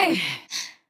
Grunt Jump; Kinessa; Paladins

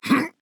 Grunt Jump; Drogoz

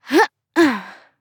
Mage Grunt Jump; Realm Royale; Technomancer

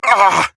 Grunt Hit; Warrior; Paladins

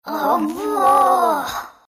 Broo death; dota2

